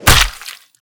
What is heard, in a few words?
sharp heavy, impact; tfa starwars melee weapons pack; nmrihimpact